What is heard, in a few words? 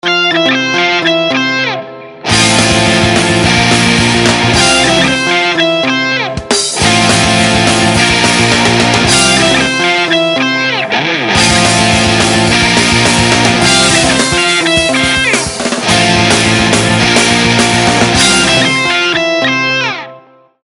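Rock, ringtones; Swisscom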